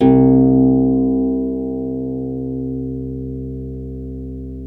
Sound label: Troubador Harp